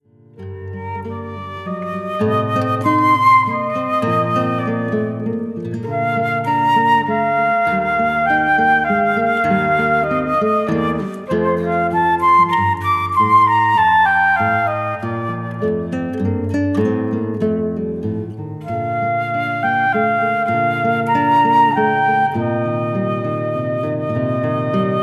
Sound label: siciliano; bwv 1031